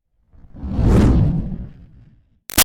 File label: Fireball Whoosh Large; Whoosh Sound Effects; Whooshes; Sound Design; Big Room Sound